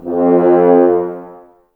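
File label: Brass Horns F; Sample CDs; Miroslav Vitous; Woodwind Brass Ensemble; F.Hrns Links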